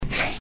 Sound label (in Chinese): 笔触